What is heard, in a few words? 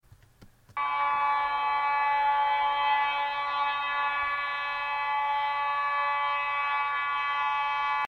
honk; indian railways wdp